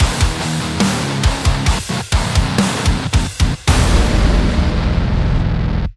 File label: lose; radiant